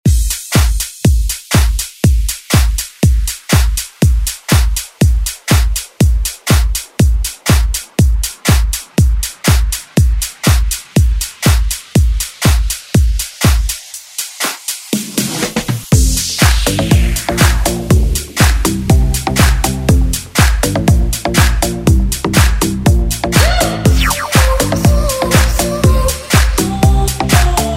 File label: Dance